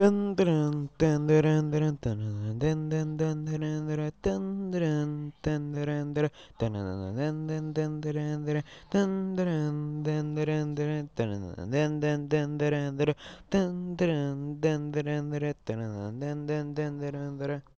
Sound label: tune dance